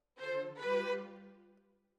Violins, jazz for piano

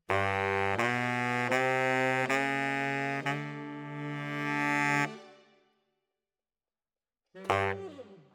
Bari, RNR for piano

